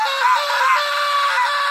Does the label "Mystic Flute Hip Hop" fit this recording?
No